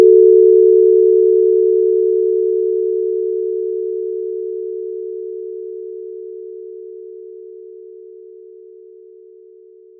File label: ring